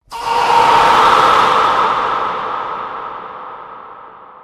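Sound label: screaming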